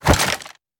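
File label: melee attack gun large; viper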